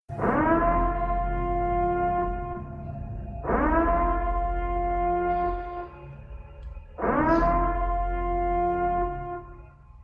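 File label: donation alert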